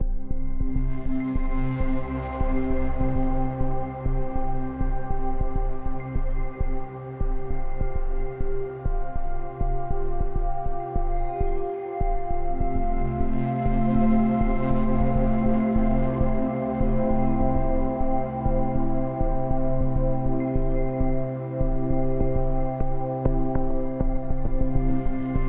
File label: Battery Puzzle Loop; pan pan a tiny big adventure